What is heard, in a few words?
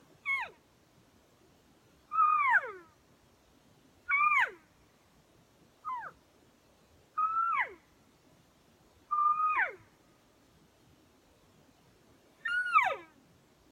Calls Cow Calls Mews, elk